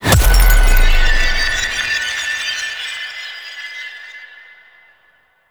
Glass Shattering Impact; Warframe

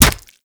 Kunai Impact Flesh; Warframe; Hit Effects; weapons

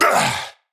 Attack Effort, Battle; Warframe; Tau; Dax; Knight

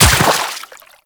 Run Water, Footsteps; Warframe; The New War; Characters; Kahl

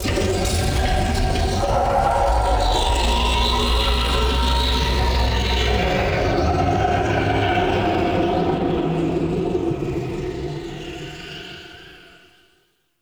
Misc Chasm Scream E; Ambience; Warframe; Man In The Wall; Entrati

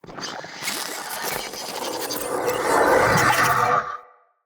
Shrimp Lantern Weapon Charge Up; Creatures; Baliq

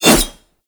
Heavy Whoosh, Dual Daggers; Prime Okina

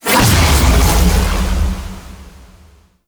Ring Shockwave; Warframe; The New War; Archon; Nira; Abilities

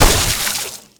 Pool Noodle Slam

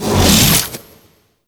Thurible End; Warframe; Priest

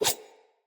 Dagger Whoosh Heavy; Sinecure Dagger

